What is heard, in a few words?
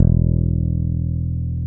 Basses, Roland